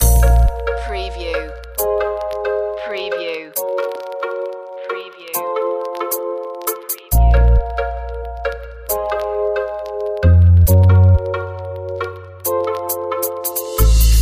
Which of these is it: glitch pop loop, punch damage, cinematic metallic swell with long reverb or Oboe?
glitch pop loop